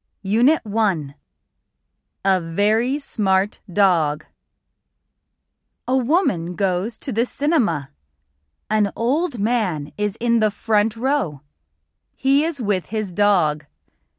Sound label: laugh and learn